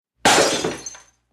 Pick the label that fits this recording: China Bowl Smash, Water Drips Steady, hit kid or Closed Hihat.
China Bowl Smash